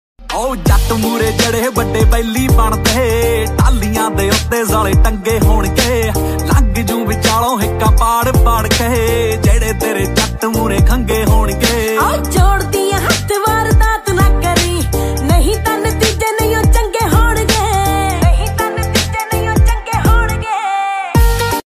DJ Punjabi Ringtone

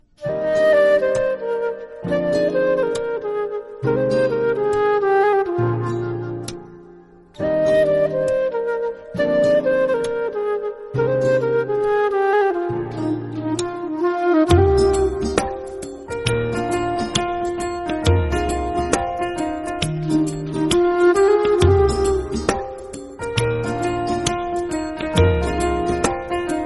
Instrumental Ringtone

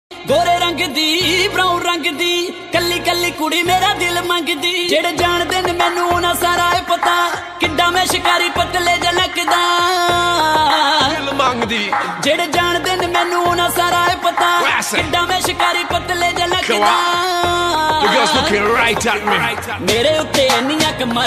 Punjabi Ringtone

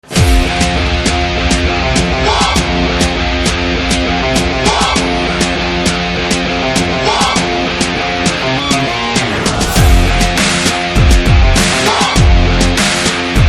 Theme Telugu Ringtone

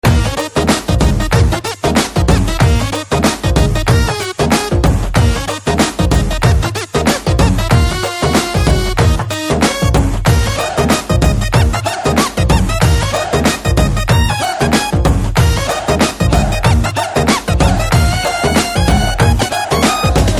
BGM Telugu Ringtone

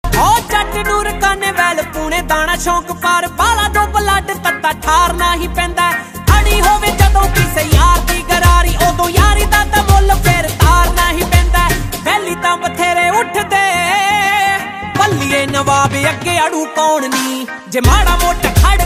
Dance Punjabi Ringtone

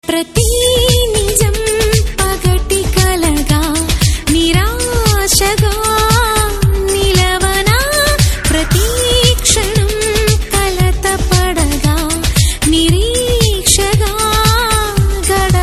Telugu Ringtone

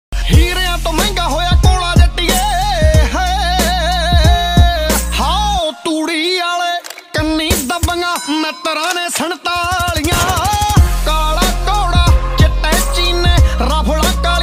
Punjabi Beats Ringtone

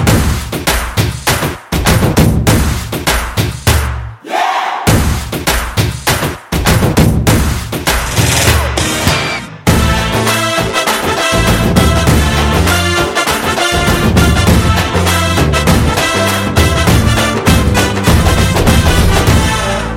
Drum Beats; Gabbar Singh

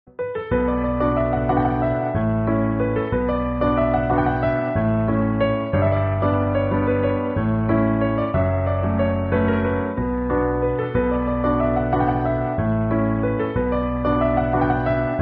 Instrumental Bgm Ringtone

